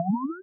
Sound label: jump; CultManor